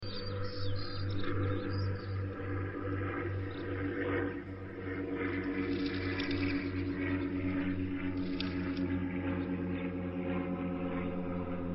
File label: Birds and Bells; Kuprevicius